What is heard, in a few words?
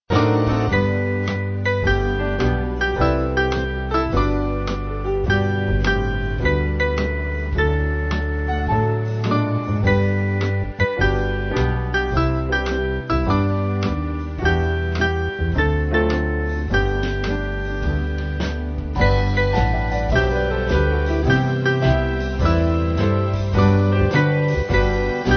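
Band, Music Backing Files for Churches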